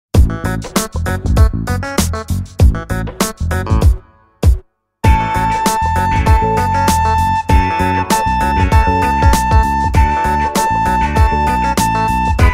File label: Step by step Funky stuff